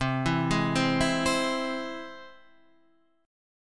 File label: C Augmented, guitar chord; C+ Chord {8 7 6 5 5 8} arpeggiated